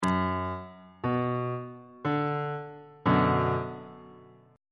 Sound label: a double sharp minor 2nd inversion